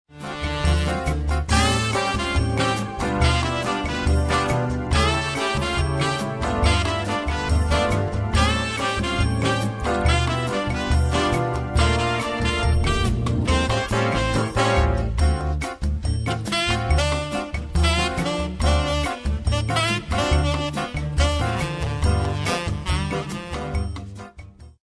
jazz lounge

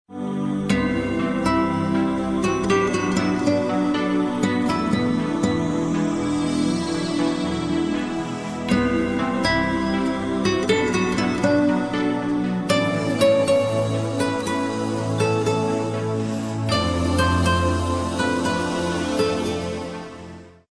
newage

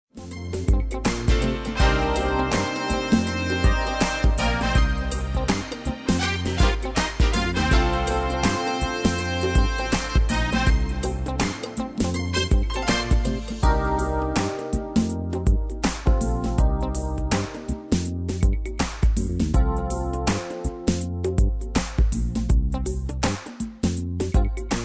ez pop

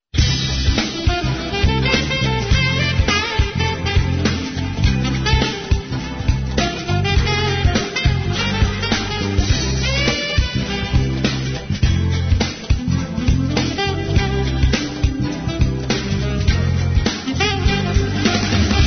jazz pop

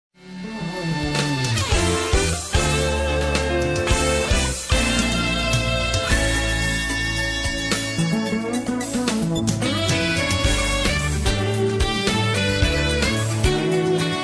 jazz pop

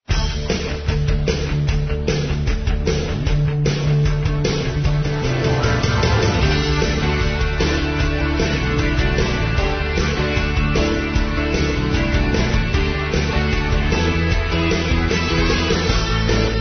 pop rock

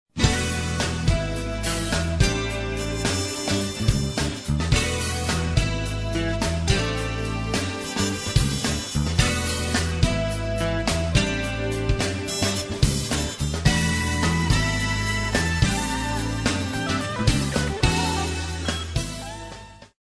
jazz funk

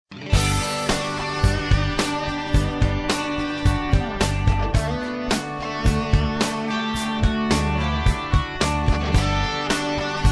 pop rock

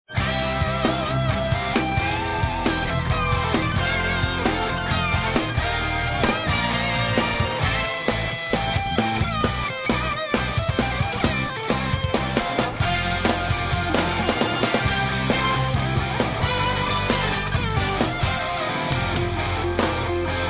rock heavy

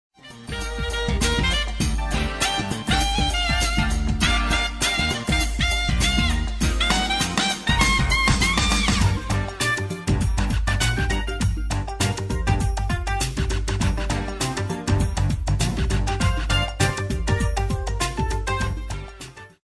jazz pop

